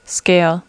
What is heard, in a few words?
scale